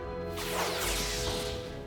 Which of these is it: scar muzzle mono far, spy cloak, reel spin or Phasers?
Phasers